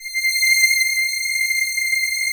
strings C7; Greytsounds; Scott Peers Sound Engineering

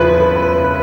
Jazz Pad

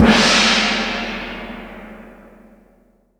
gong cymbal

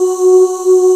Oohs F#3; Proteus 2000; Digital Sound Factory Proteus 2000

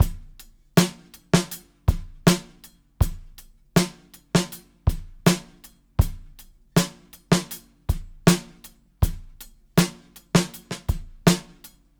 Live Beats, R&B Drums; Sonic Foundry (Sony Creative Software)

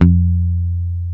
HIPH F, bass; Spectrasonics; Bass Legends CD 1

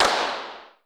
SHOT2; Crypton - DrumBase5000; R70 KIT #2

